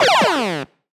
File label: Retro FX; Cymatics; Video Game Sample Pack Vol 1